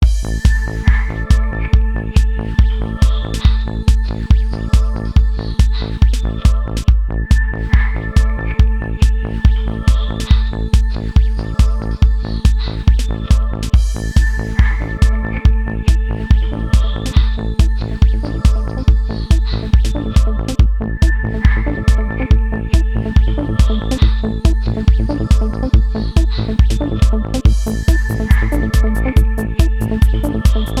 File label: gem s3 turbo trance